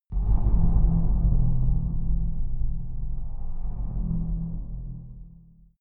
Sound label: far dark metal rumble, hits impacts